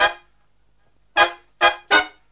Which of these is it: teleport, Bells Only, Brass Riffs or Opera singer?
Brass Riffs